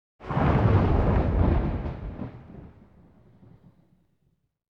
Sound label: Thunder Clap Single, ambience